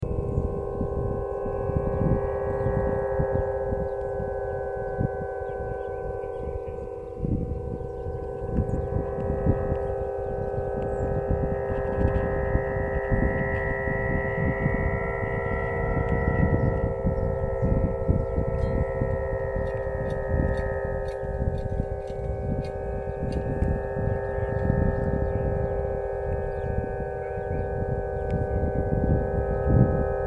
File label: table harp